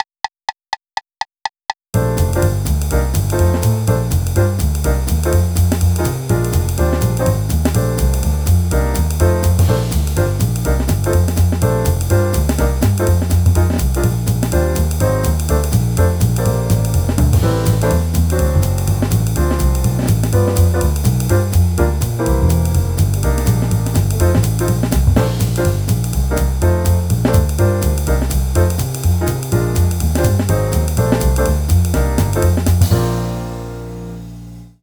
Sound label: Rhythm Changes Bb fast